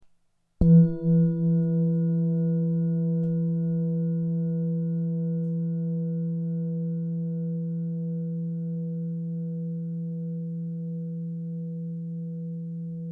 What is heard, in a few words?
163.83hz; bol 18cm mi